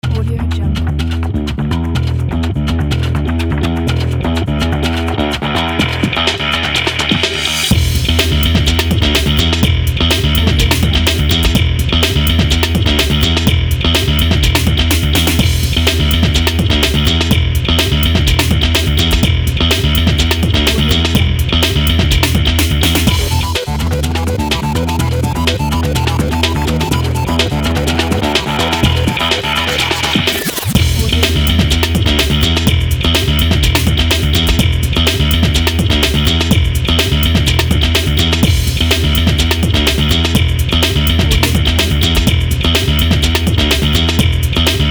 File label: action trailer music